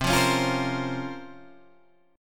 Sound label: Db Major 13th C